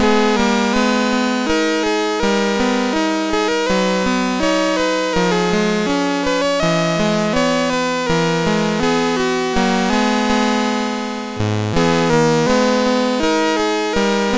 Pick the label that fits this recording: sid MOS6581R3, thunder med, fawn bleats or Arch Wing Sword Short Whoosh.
sid MOS6581R3